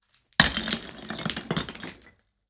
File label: Small debris thrown on a rock pile; impacts